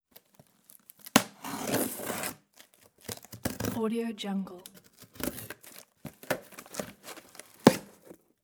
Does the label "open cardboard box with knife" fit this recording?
Yes